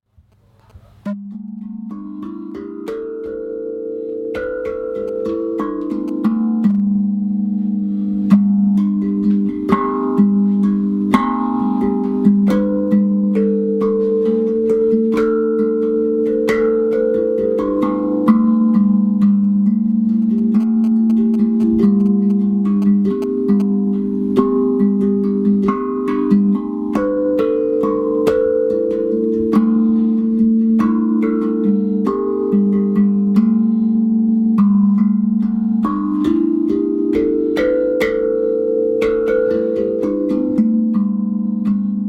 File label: Large Babel Drum